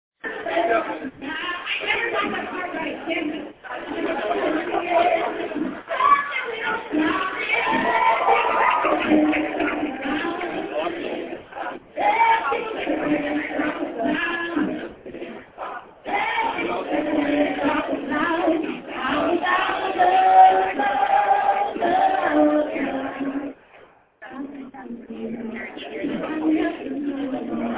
Netherlands Cafe Coffee House; singing

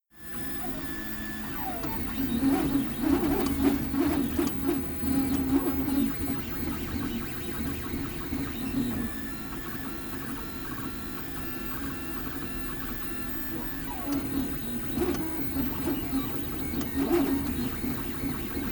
3D Printer

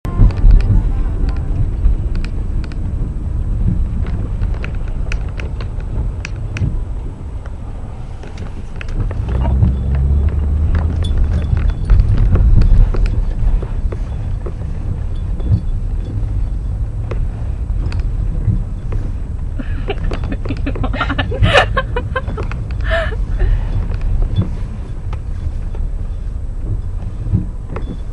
Car Sounds; car radio, blinker sound, keys

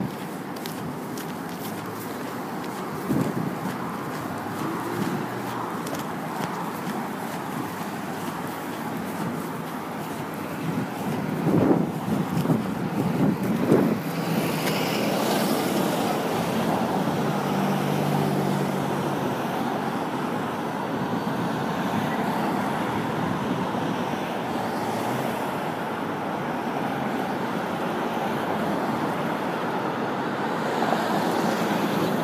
Traffic Under The Netherspan

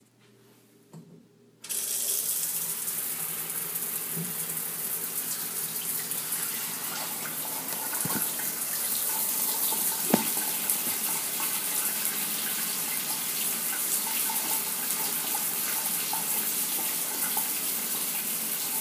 Shower; Basement of my house

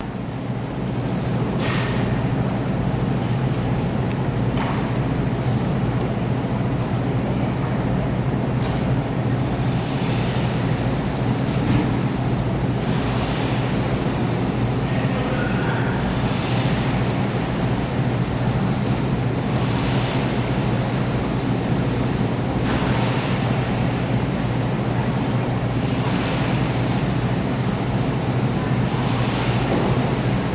Student Center Wednesday Morning

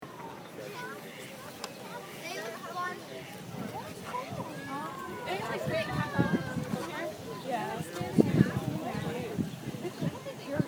Zoo by birds; March 31st, near Hofstra Hall, 5:50pm

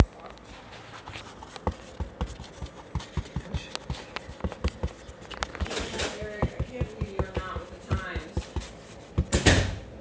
Rachael painting

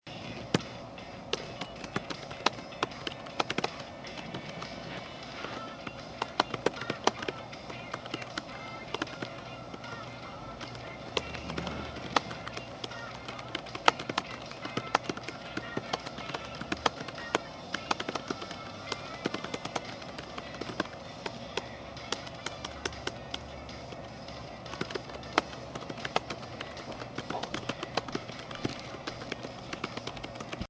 Typing Music

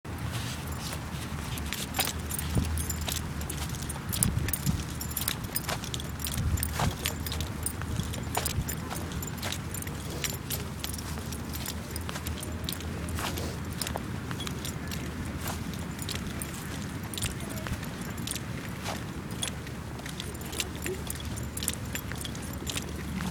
Walking

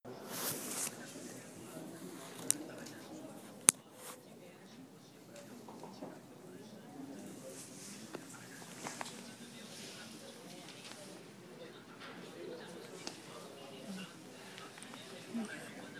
Library

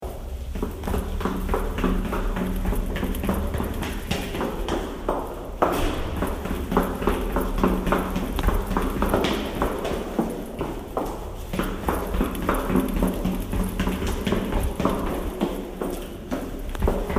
Running Downstairs

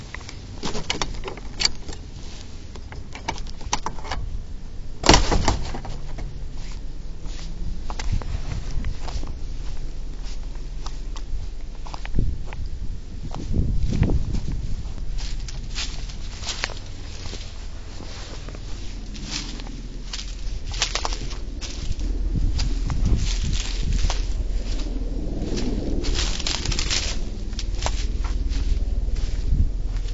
Leaves and Twigs; backyard

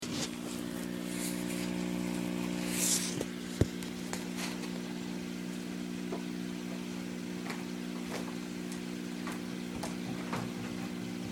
Fish Tank, hum; Location: My kitchen, Hempstead 9:49pm; Field Recording #2

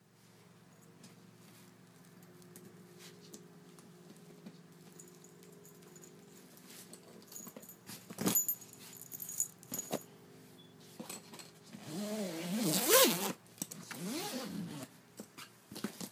Suitcase y things

